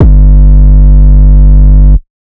808 Bass Harder G3; Slate 808 Deep Dive; SD Signature 808s

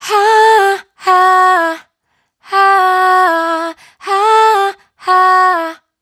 riff Oh Hah Em, Dry Vocals; Raw Vocals; Slate Digital Omnivox Vocal Sample Pack

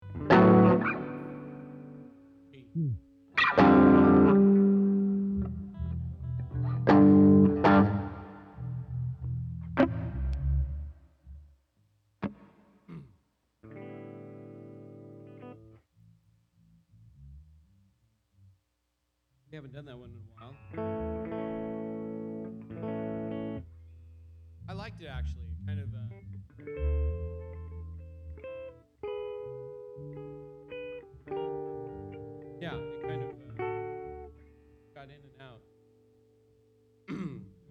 Strings Emphasis Mix

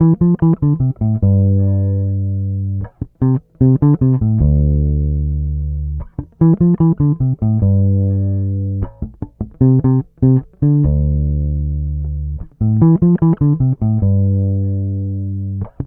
Bass loop 150 Dm, Electric Bass Loops Direct; Slate Digital BASSHOLE Sample Pack

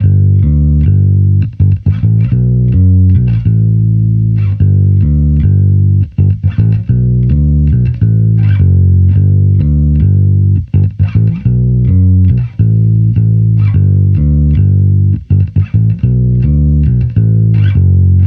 Amped loop 105 D, Electric Bass Loops; Slate Digital BASSHOLE Sample Pack

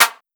Clap, 808 Claps; Slate; Vintage Drum Machines Volume 2 808 Edition

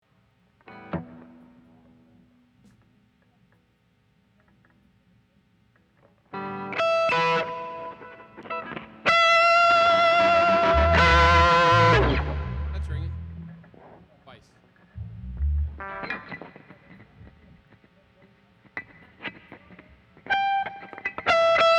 Strings Emphasis Mix

